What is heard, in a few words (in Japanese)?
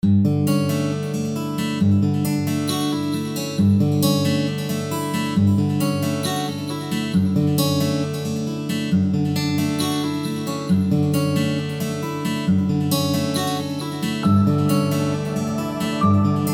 海岸ステージBGM Loop; Tower of the Approval; すずなりのおと